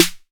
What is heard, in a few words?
808 SD Tape, Snare Drum; Tape808; Goldbaby Tape808 & Tape909